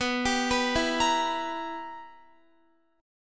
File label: B Major 7th Suspended 4th Sharp 5th Guitar, chord; arpeggiated; x,14,17,16,16,17